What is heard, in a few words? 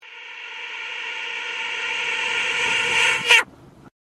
disappearing duck quack